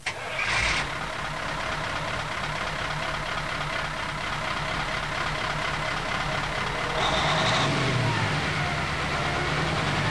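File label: TRAFFIC, car sounds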